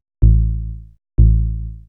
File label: HOUSE BASS 125 A